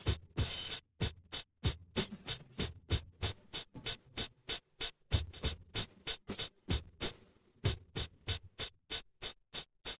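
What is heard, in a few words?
with drums inst src; SiSEC08; SiSEC underdetermined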